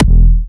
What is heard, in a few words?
Harder E4, Kicks, Big Room Kicks